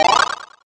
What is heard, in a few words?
Heart Container; SSBB